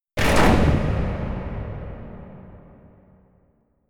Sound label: close the door